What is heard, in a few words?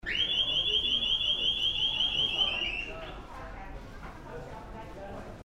Shopping; Alarm; Kaufhof/Düsseldorf; Christoph Collenberg